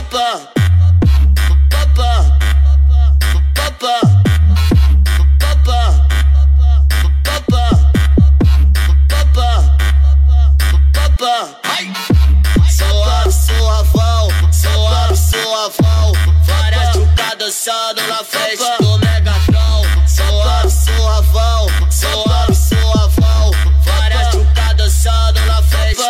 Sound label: funk bass